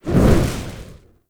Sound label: Sticky Bomb Proj, Weapons; Grineer; Veilbreaker